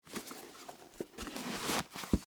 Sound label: Boot Put On; Foley; Dressing (Sound Effects:Human)